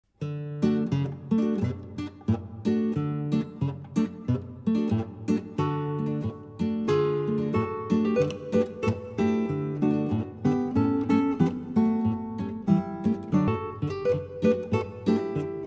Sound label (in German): Polka